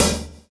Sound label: Hi Hat, drums; Sonic Foundry (Sony Creative Software); Drums & Drones; Construction Kits; Ventria Kit